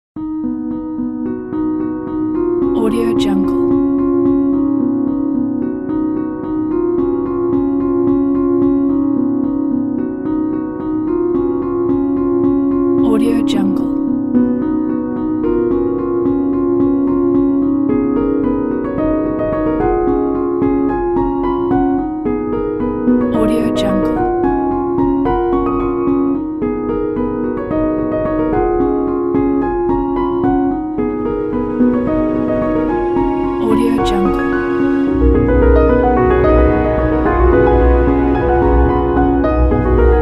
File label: silk piano inspire